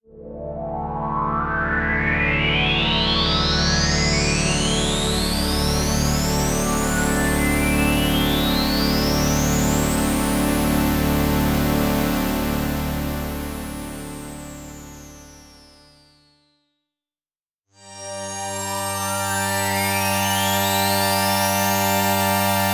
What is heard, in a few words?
Quantec QRS Strings